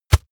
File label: plastic tense bag heavy